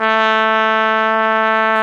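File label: trumpet mf; Solo Brass; AKG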